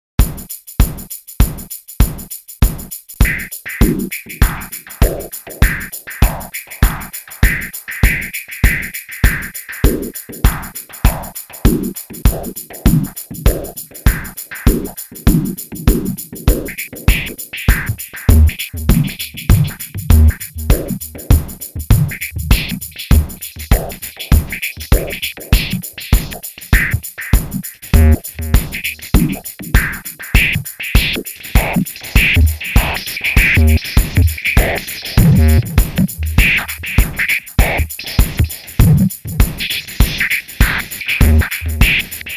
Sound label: Beat mangeled